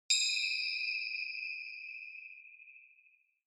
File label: Single Accent Single